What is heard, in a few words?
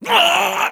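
voice shock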